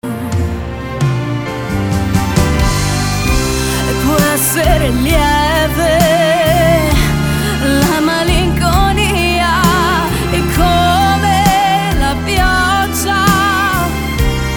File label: Notturno